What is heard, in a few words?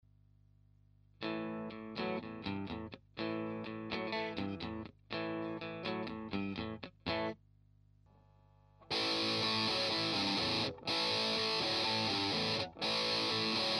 boss mt 2 metal road mod; Dexter Mod Pedals